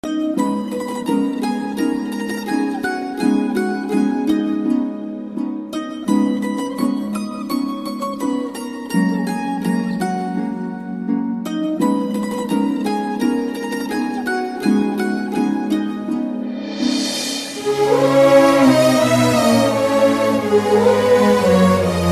movie ringtone romantic love feel bgm; Teenmar movie; Pawan Kalyan

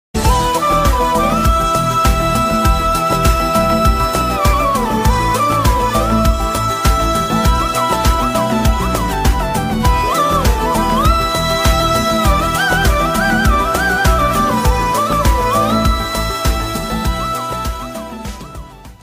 Movie BGM lovesong love music

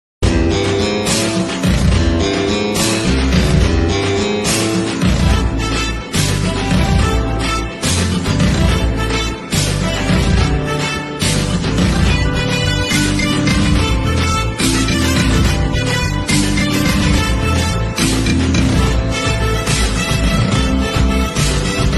bgm; amaran

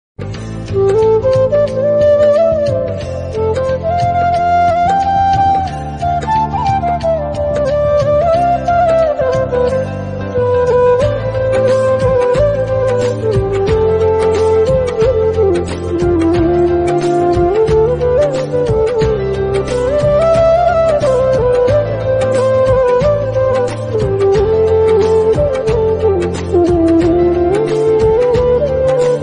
instrumental ringtone, bgm ringtone

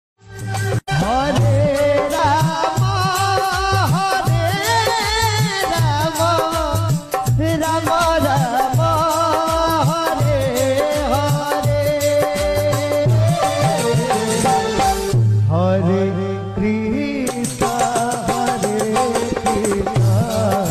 hare ram hare krishna ringtone; instagram

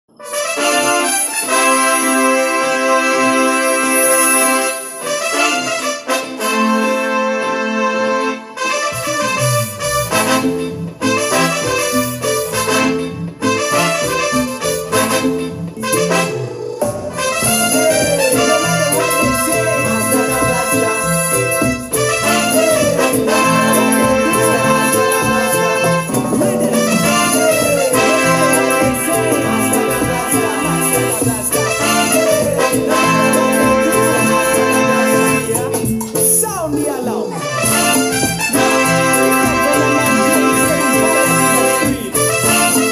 bgm; thalapathy vijay

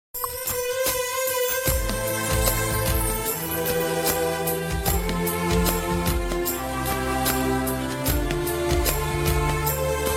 bgm heart melting love bgm tamil bgm ringtone

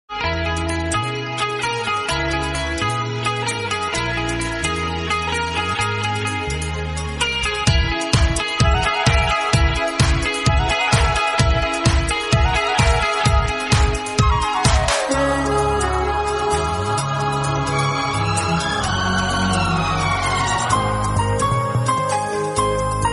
hindi ringtone

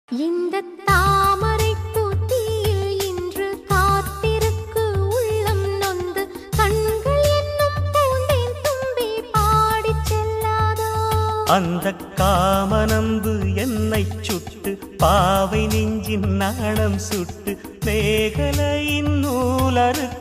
tamil movie song; siraichalai movie; lyrics video

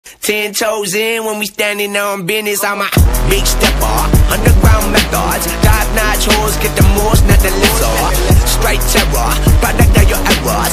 indian rapper

